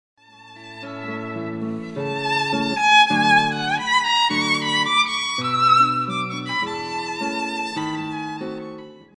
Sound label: duo violin guitar classical duet